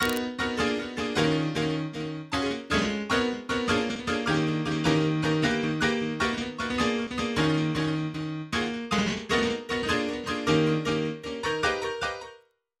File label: Intro Future Chords WET